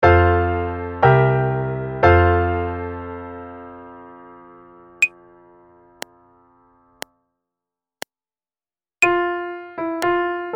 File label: Prepared Melody; MUS 240 Practice Sheet 1; advanced musicianship